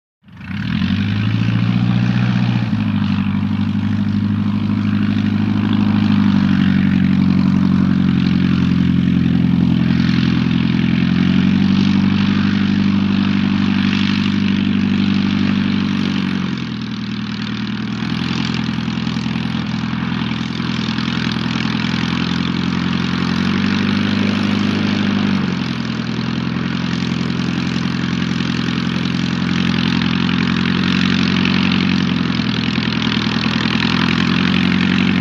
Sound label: Prop Plane Taxi Old Pr, vintage